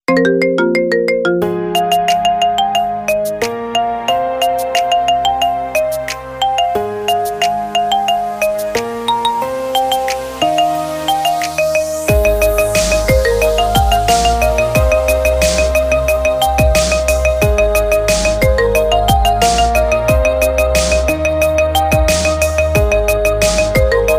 marimba

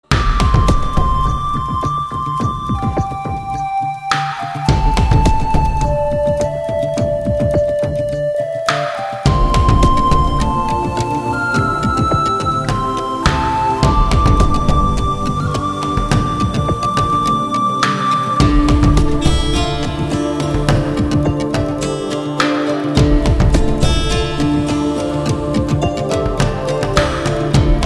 super tone

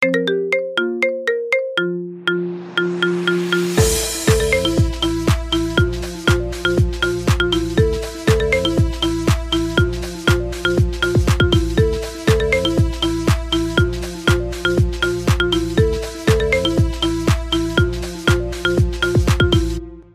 marimba remix ringtone

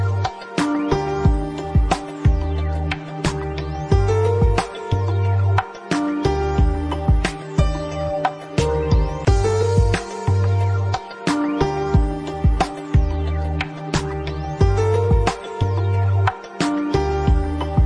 romantic

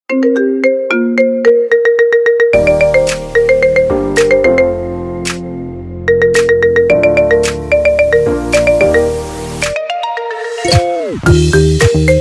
marimba remix

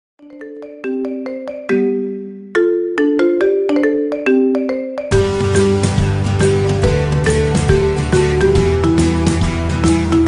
marimba ringtone remix

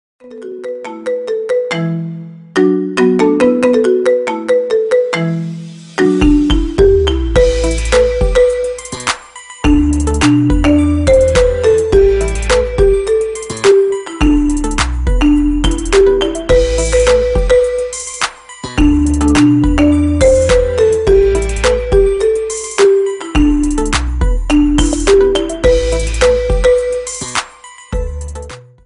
marimba ringtone remix

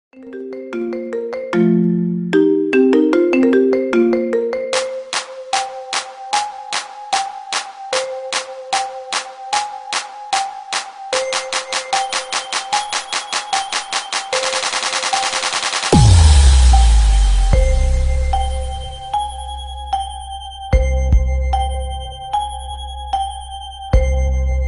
marimba ringtone remix

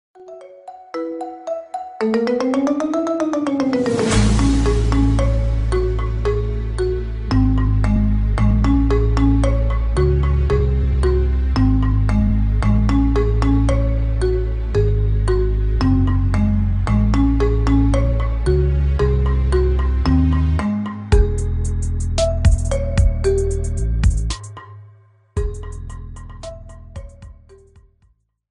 marimba ringtone remix

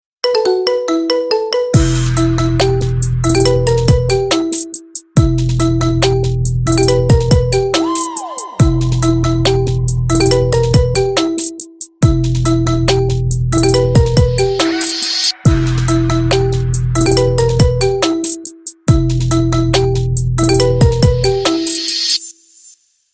hip hop marimba remix

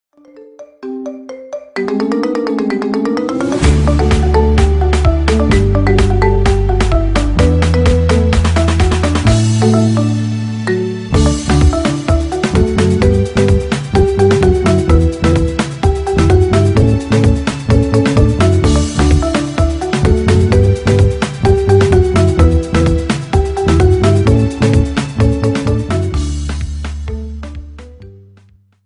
marimba ringtone remix

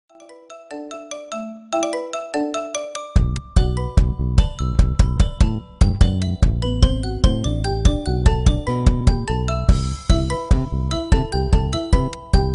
marimba ringtone remix

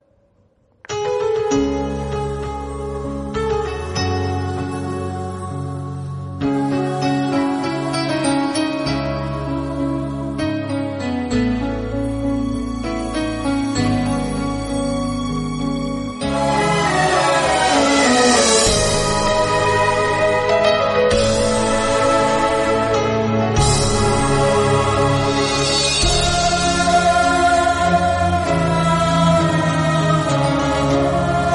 sad ringtone

